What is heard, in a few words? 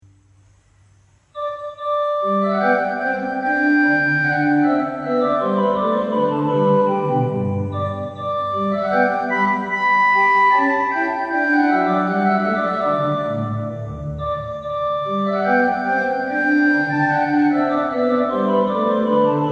sonata in g menuett